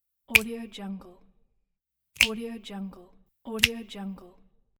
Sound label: snap